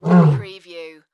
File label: Lion Grunt